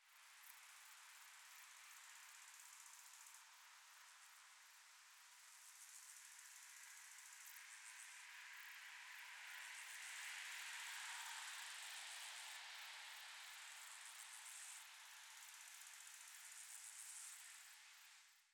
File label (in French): Bord De Route Allemagne Passage Voiture Et Sauterelles